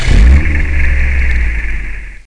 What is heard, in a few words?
car Idle; HOEDOWN